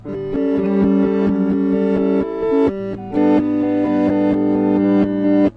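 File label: threebar backwards melody, 129 bpm; SYNTH AND ELECTRONIC LOOPS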